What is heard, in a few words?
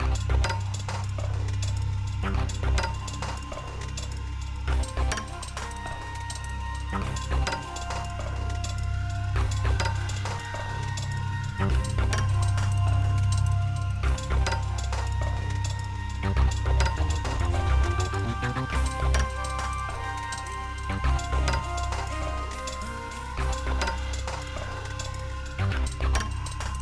TUNNEL music